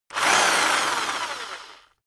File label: rock drill rev medium medium; maschinen; bohrer